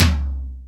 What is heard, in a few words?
Percussion, House